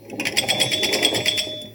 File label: pinball bell